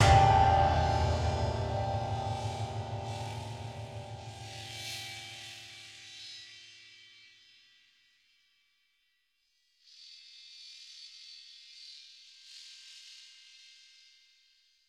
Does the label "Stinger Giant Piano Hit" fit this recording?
Yes